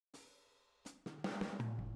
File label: Boogaloo, Intro, Room, Drums, 82 BPM